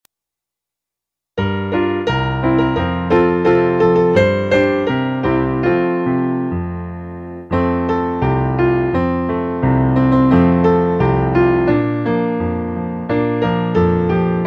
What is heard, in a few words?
Jingle Bells